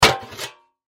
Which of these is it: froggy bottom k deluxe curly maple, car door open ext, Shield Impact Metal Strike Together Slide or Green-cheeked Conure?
Shield Impact Metal Strike Together Slide